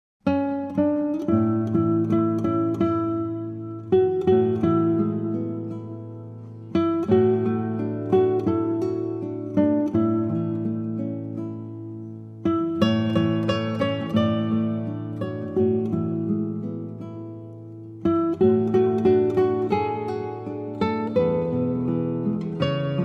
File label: acoustic praise, instrumental